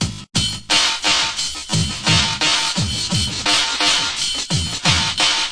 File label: 87 bpm, cubist grainbreak, drum loops and breaks